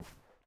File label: Step Carpet; miside demo